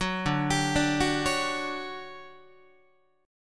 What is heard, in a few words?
D Major 11th D down Guitar 4A 4E 5A 5D 5G sharp 6C sharp; arpeggiated; JGuitar